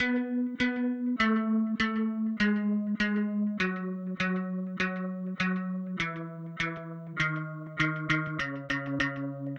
bass

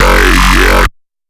F# Strong, Dubstep Bass Hits; Oneshots; Serious Dubstep

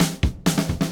Drums

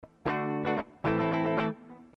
Guitar

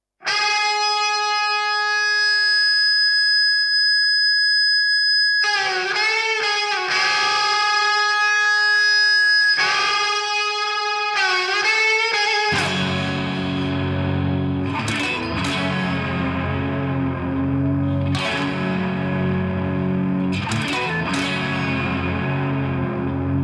guitar

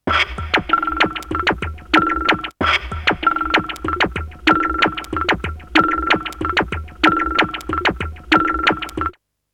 Chemical Beat; Multitrack

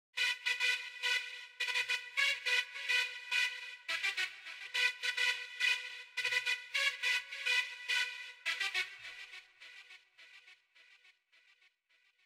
Synth; Remix Sample Pack

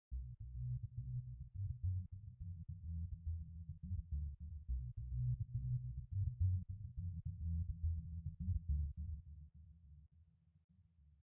Bass Synth; Remix Sample Pack

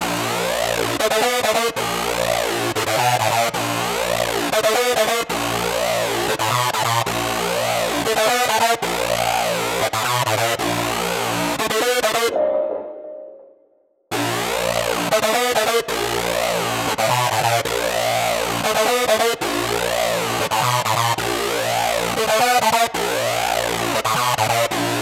Synth

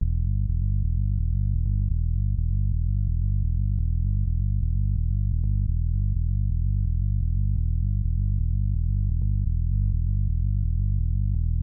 Bass

